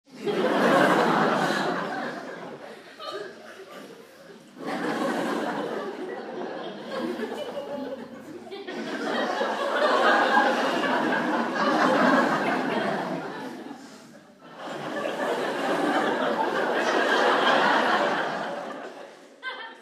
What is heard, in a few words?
theatre audience